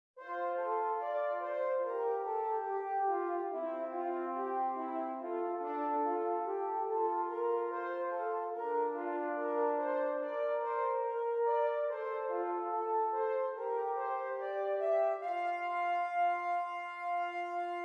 Esercizi di contrappunto a due voci; dritte Gattung; Ronge pagina 59

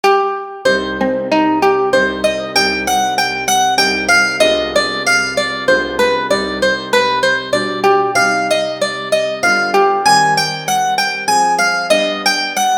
Adagio per Mandolino e Pianoforte; Trascrizione integrale della variazione cancellata

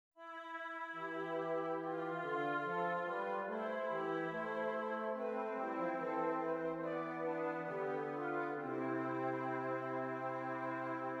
esercizi di doppio contrappunto nella decima; Originale Beethoven

